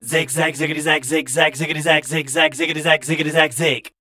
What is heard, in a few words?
SCAT #D